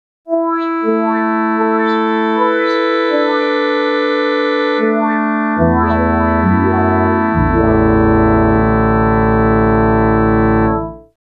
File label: FX 2 Soundtrack